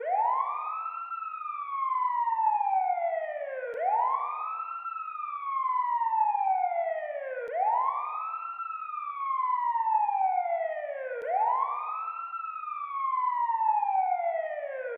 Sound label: siren, vehicle